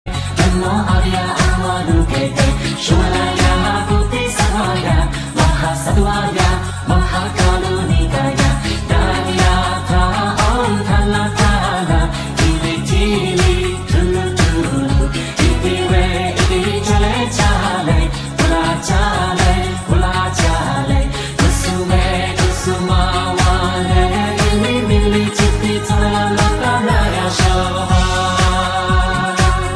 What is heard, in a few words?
disco